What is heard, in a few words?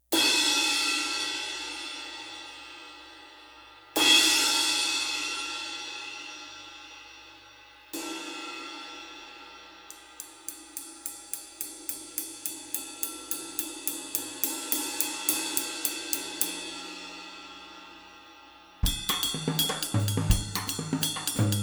20 Zildjian Heavy Ride; Erskine